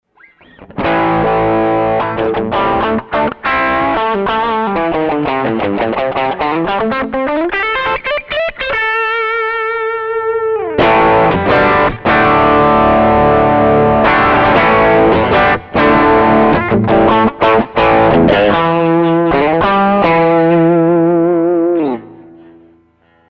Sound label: strat direct